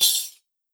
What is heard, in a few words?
tamb, percussion